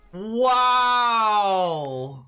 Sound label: wooooow